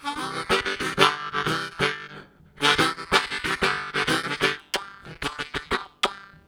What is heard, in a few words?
Bass Kiss, Harmonica; Zero G